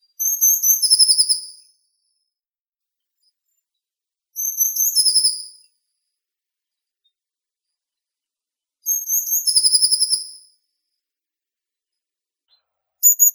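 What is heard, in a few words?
blue tit, Cyanistes caeruleus; lazorevka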